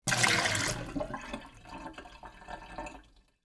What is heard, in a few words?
emptying water, kitchen